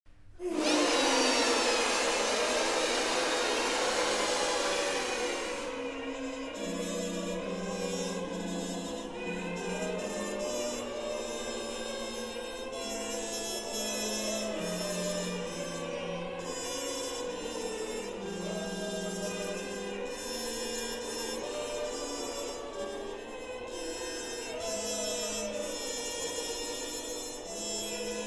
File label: A Buzzing of Bees; hymnofstfrancis